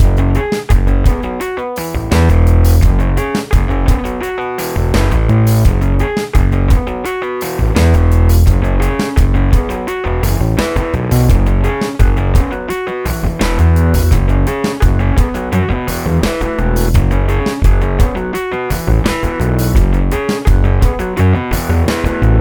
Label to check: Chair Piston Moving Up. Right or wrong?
Wrong